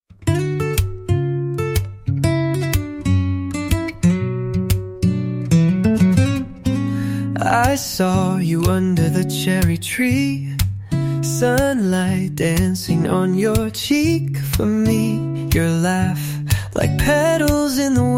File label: spring bell; 봄 벨소리; 안드로이드